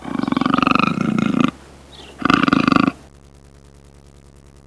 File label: cougar sounds